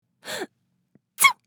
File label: Cute woman sneeze